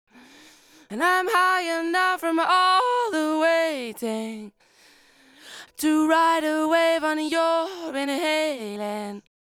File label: lead vocal chorus; Multitrack